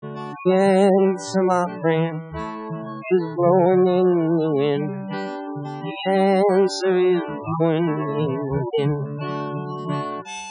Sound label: sine; Synthesized sinusoid